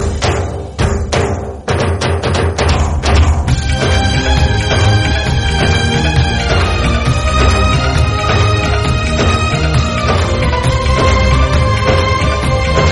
instrumental

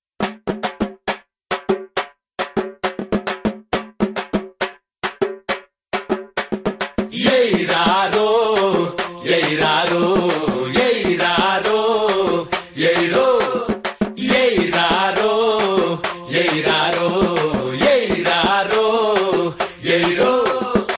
Drum Beats; Republic

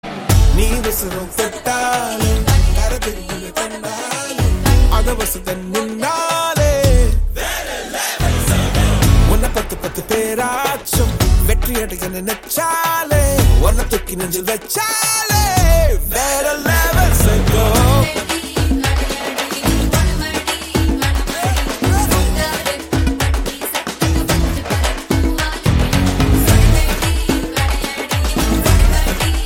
BGM Ringtone; Ayalaan

